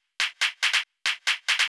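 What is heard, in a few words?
DRUMLOOP ELECTRO 140; Techno2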